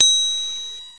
Crystal; Safari

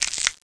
Crack; Princess and the Pea; SoftKey

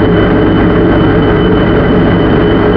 atmosphere; KATANA; Daikatana

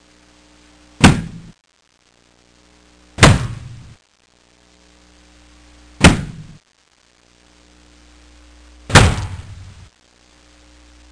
Various Thuds

